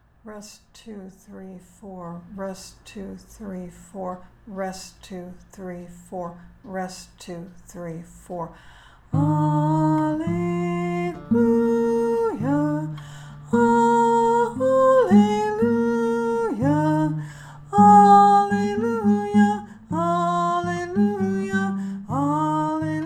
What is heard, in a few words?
Allelujah, choir; Easter 2023